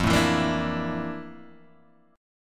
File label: F Major 13th Guitar Drop D